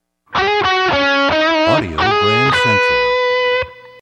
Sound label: Desert Wind, radio jingles